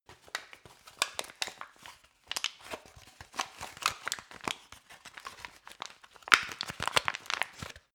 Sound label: plastic cup handling